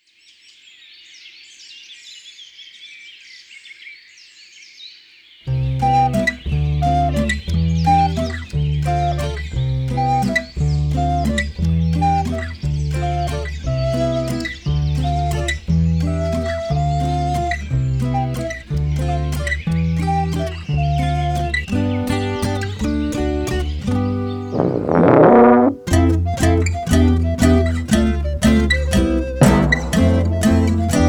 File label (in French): Version instrumentale